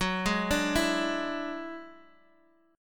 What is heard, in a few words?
F sharp 7th Suspended 2nd Sharp 5th, down, Guitar, Open D